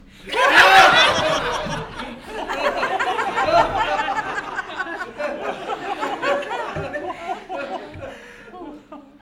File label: Laughing Audience Laughtrack